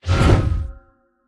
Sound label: swing; smite; atlas